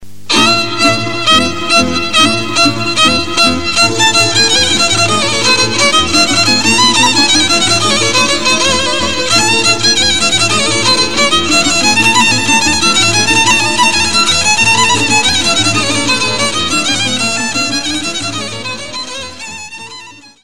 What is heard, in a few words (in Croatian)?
Slavonsko Kolo, Croatie